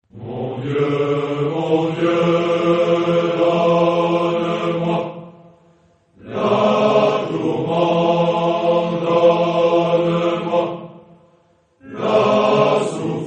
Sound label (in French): priere